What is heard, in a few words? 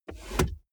car bmw e46 interior compartment open